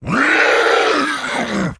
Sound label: Infected Quillflinger Play